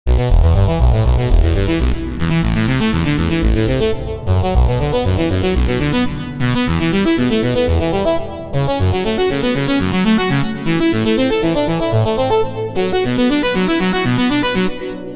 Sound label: mercury arp; the space soundset